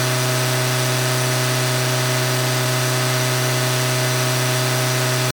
Engine Kart Rpm High; multiplayer kart racing; boom karts; android

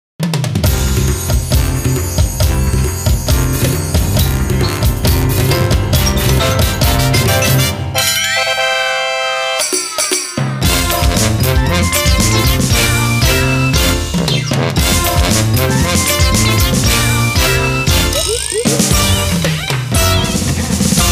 Openning BGM; ps2; Taiko no Tatsujin 7

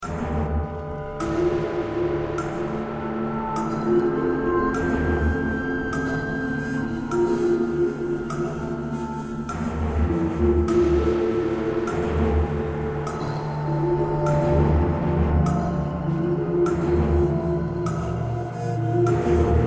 Dissonant; Impending Doom; Cultist Simulator; windows

